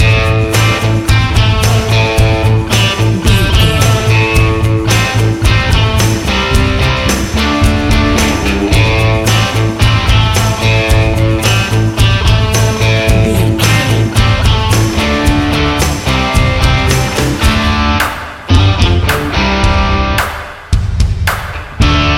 highway rock loop

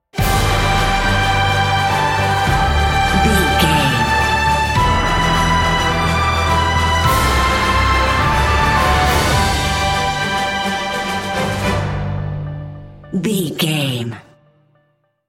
cinematic adventure

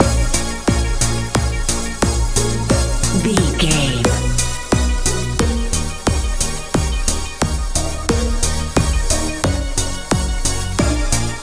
sunny nice modern pop

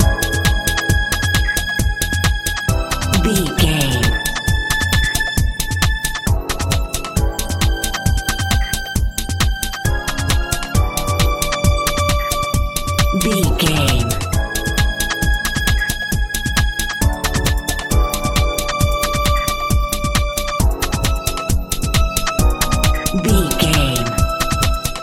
pop dance

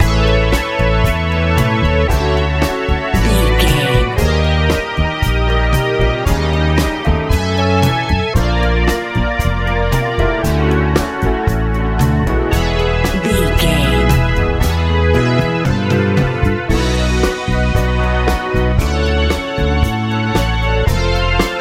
soul jazz

